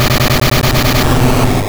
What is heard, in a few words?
Explosion Start; Pokemon; Gen 2; attack moves; GSC